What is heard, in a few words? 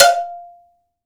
COWBELL; AKAI S6000 CD-ROM - Ultimate